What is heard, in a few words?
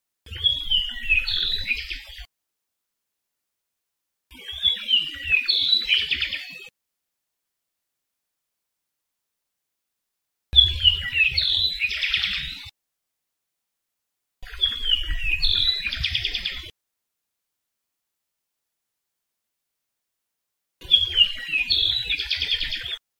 apapane; Saddle Road Birding